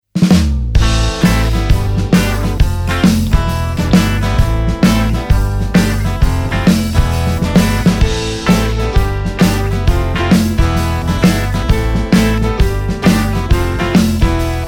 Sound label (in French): Dans tous nos eclats Instrumental